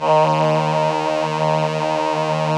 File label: VP-330, String Machines